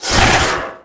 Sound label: revert metal, skater; Tony Hawk's Project 8; XBOX